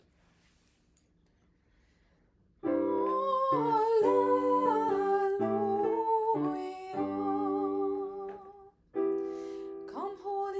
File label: Alleluia Pentecost, choir; OLL choir